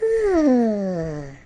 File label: sighhh; roblox